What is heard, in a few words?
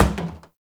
Plastic Hit Short; Warframe; Ambience; Nineteen Ninety Nine